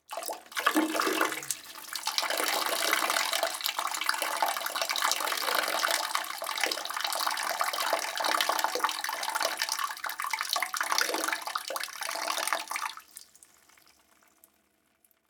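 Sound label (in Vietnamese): Tiếng đi tè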